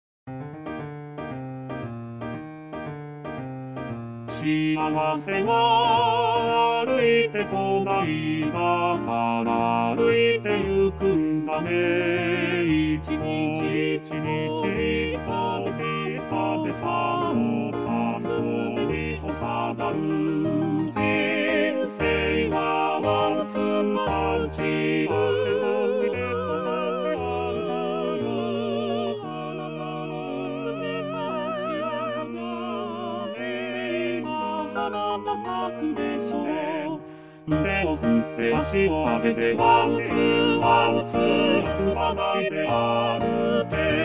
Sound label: march Chor; 合唱; Sop Alt Ten Bas